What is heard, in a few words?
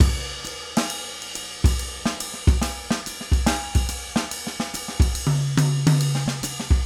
trip jazz 140